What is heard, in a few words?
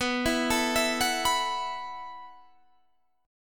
E Suspended 2nd Suspended 4th B